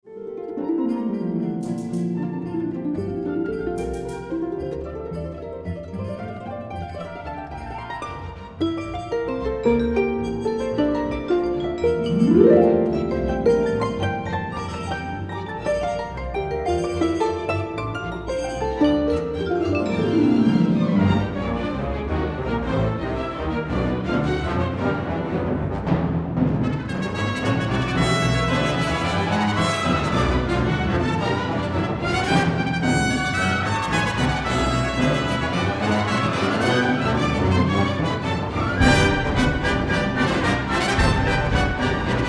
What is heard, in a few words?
Harp Concerto Rap Knock; ORCHESTRAL WORKS